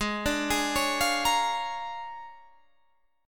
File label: Bb 7th Sharp 9th Ab